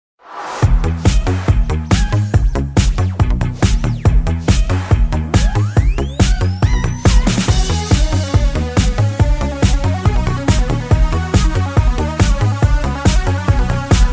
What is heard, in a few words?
remix